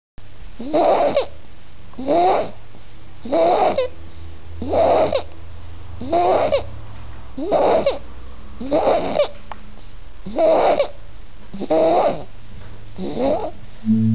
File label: snoring